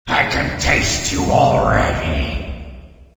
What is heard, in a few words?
alert; slender; killercroc